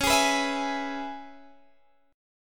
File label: Db Minor 7th Db; strummed